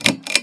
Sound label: chain switch; cs2d; cityrp; MCity